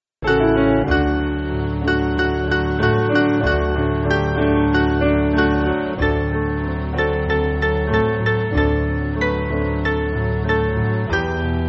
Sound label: Piano; Quam Dilecta; Mainly Piano (CM)